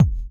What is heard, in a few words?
BD; Best Service; Dance Mega Drums